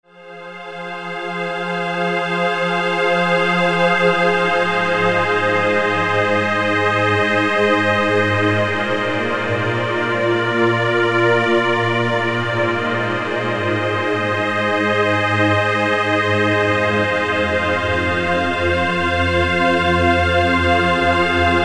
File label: pads